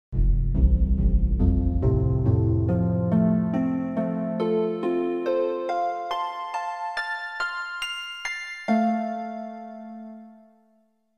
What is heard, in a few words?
harp